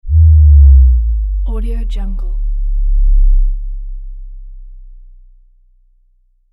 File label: shock wave boom